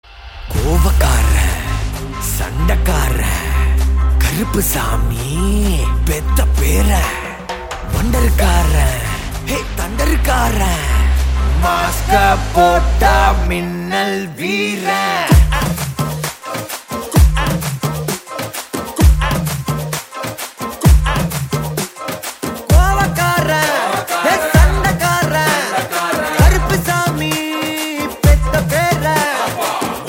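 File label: background music Ringtone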